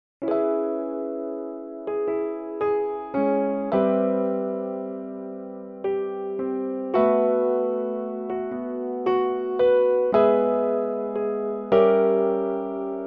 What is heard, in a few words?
grande piano